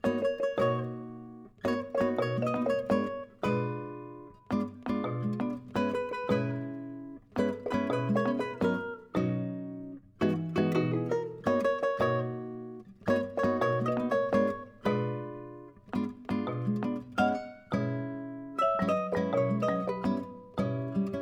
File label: Day, Rainy; Mystery Island Tour